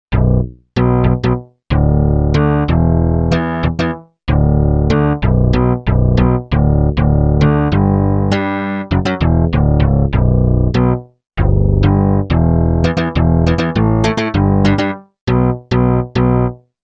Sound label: Syn Bass, Korg MSC-1S; Korg MSC-1S Variety